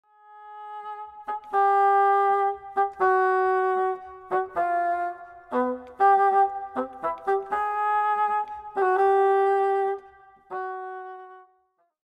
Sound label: fagotto